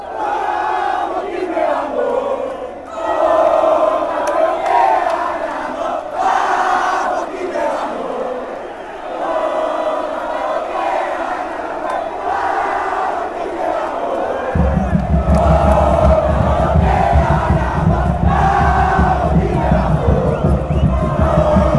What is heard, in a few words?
quilmes, chants